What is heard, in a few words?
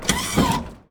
missile dock; Weapons; ekgunnery; EK modern; Barotrauma